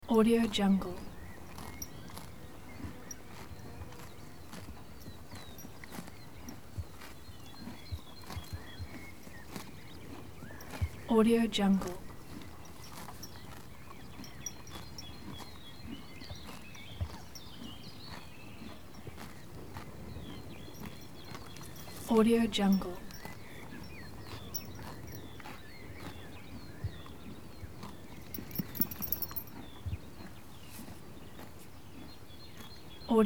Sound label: village horses eating grass shaking birds insects